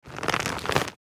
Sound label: Old shopping bag rustling